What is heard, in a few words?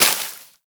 Bullet Impact Debris Grass; Warframe; Weapons; Bullet Impacts